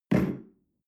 espresso place tablecloth slide short, restaurant cafe